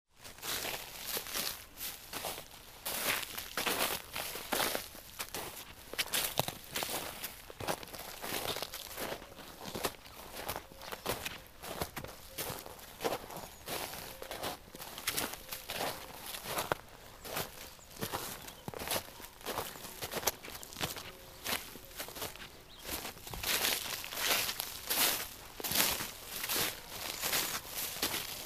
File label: farmers footsteps crunching thru snow in field